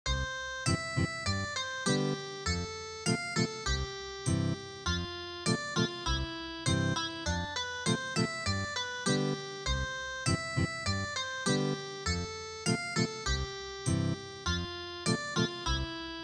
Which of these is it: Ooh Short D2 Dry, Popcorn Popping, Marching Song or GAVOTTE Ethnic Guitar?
GAVOTTE Ethnic Guitar